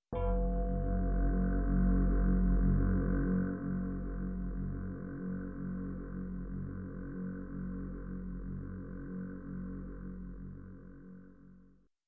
Dark spooky growling sound layer

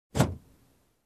Car locking from inside

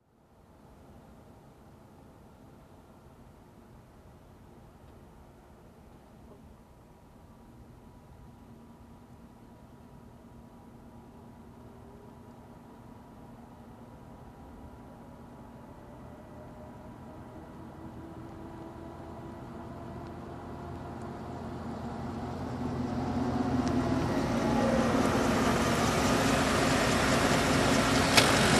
Old car approaching and parking

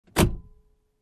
Car interior compartment close

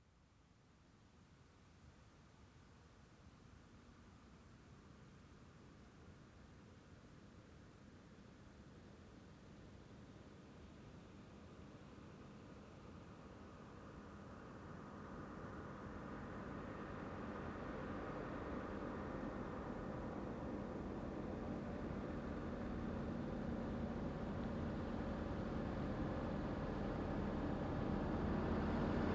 Truck driving by slowly

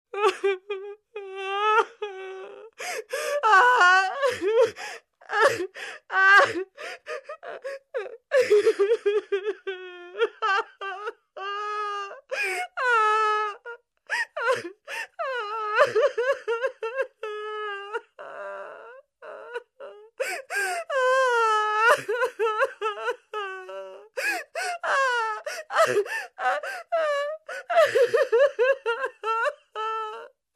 Adult Woman Crying

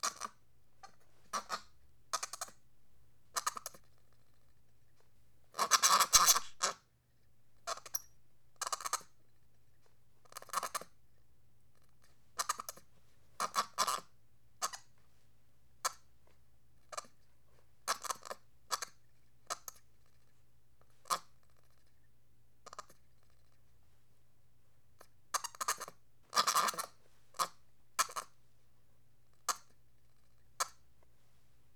Monkey Excited Baby Chirps

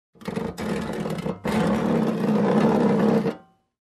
Wood Piling Noise

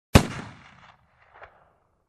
Pistol shot single

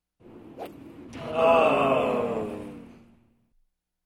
Crowd dismay reaction

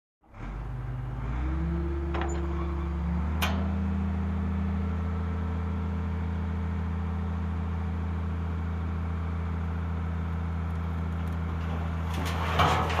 Dump truck unloading

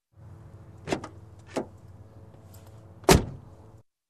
Getting in Truck

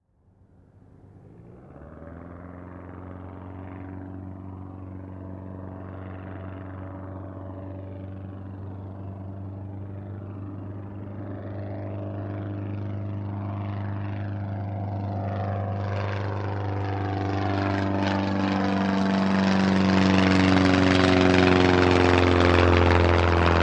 Light Aircraft Distant Approaching and Passing by

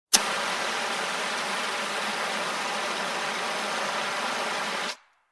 Fire Extinguisher

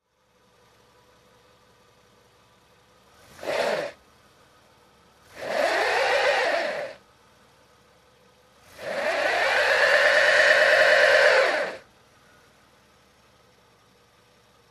Car V-Belt pulling

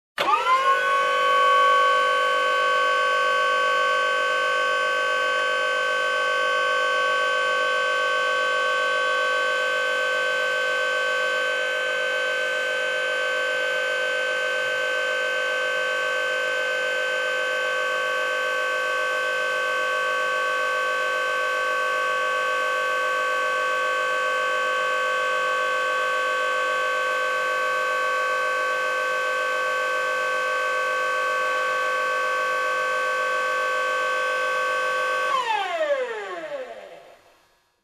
Hydraulic Machine turned on and off

